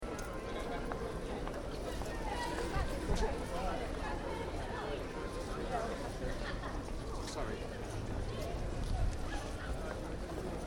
Town (Small) Ambience, People, Slight Traffic In Background; Exterior town; Outdoor; Stuart Duffield